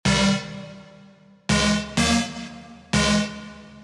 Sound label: Vital Synth Techno House